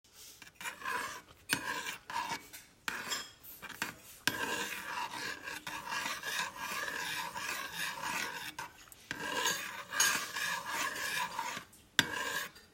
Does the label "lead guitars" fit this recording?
No